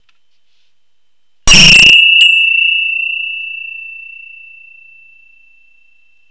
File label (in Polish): Dzwona